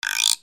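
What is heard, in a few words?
percussion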